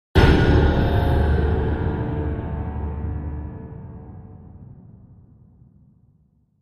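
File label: Impact Double Metallic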